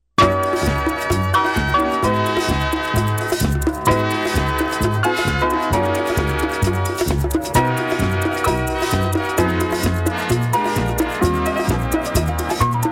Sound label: Disco Prize Music; Game Show Sounds